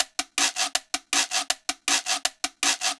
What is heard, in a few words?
Quiro, Latin Percussion; Sonic Foundry (Sony Creative Software)